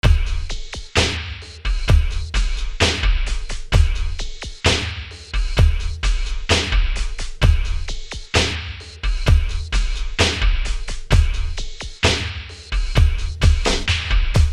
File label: hip hop house drum beat 65 bpm